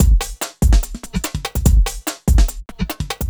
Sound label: kika 145